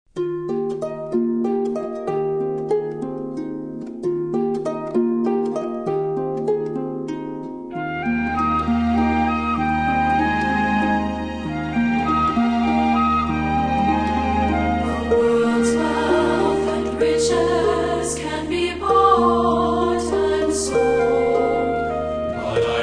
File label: Singers And Orchestra